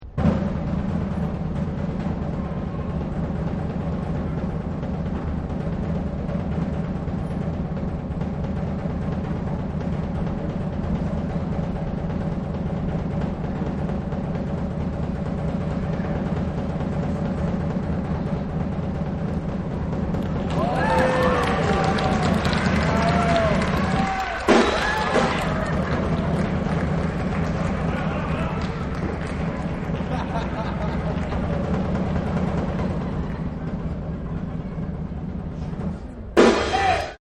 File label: Circus Drum Roll; Amusement Parks; Backgrounds